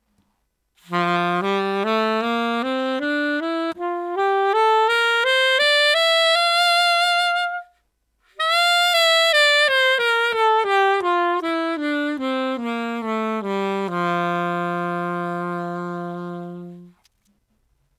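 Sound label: jazz, saxophone mouthpiece; tramontin black white mouthpiece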